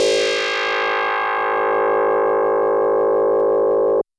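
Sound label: DX100 A#0; rocket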